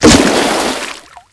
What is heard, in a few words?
bomb hit; Re-Volt